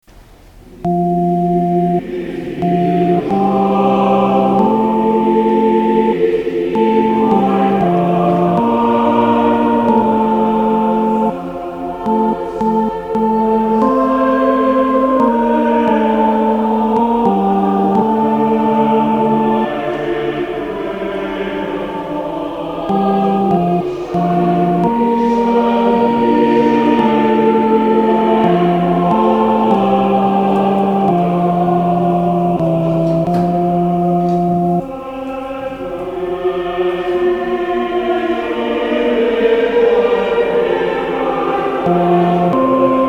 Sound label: Soprano accomp